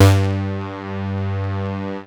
G2, BASS; Q Up Arts; Dream Experience; BASS BANK 13

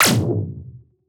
weeee

